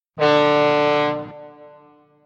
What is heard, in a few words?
horn